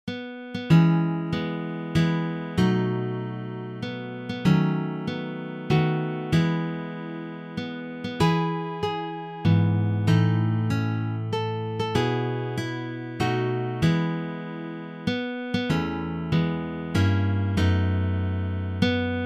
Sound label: Happy Birthday with swing